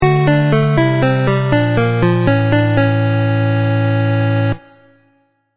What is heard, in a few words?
game over; Squareboy vs. Bullies Arena Edition; 3DS, PS Vita, PS4, Switch, Windows